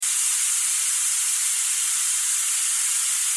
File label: Gas leak; The Joy of Creation Halloween